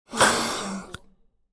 inhaler release

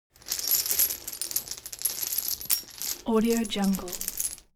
object metal chain pull up

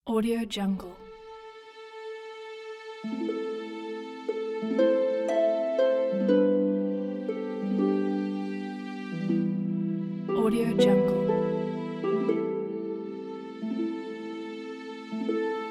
medieval ballad

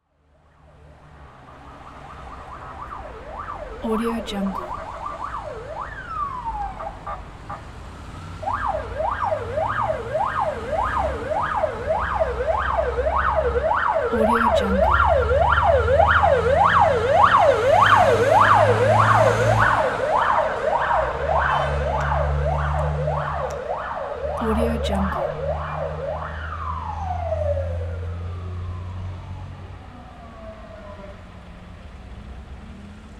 ambulance car

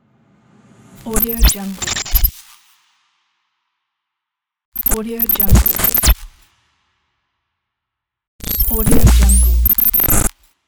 glitches

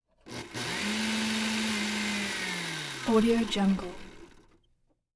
blender water and motor; prosoundfx

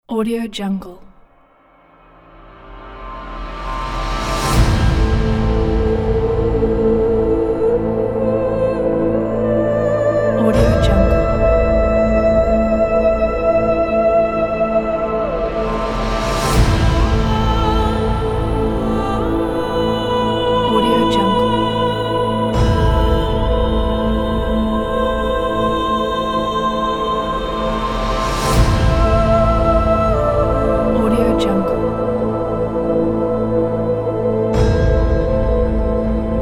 african vocals

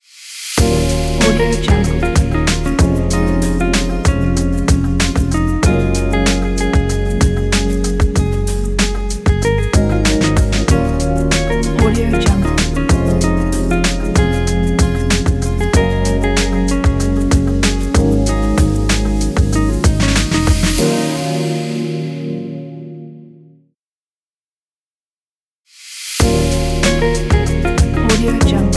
guitar soul logo

